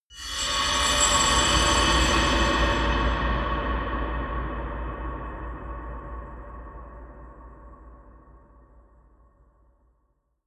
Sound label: eerie industrial swell